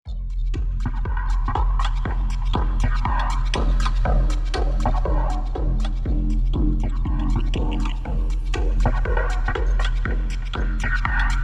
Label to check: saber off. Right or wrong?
Wrong